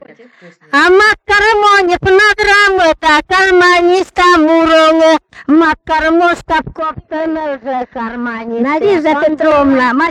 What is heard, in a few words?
Harmonica song, selkup